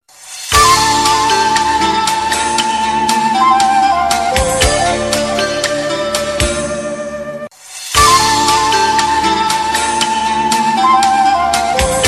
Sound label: China Sound